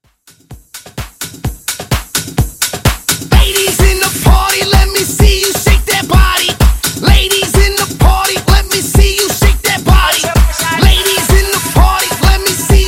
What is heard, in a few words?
intro outro 128 bpm